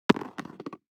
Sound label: DICE on Cardboard, Throw and Roll, Metal, Two Dice; Samples; ArticulatedSounds; DICE Sfx Party (Extended)